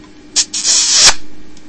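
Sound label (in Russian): поцелуй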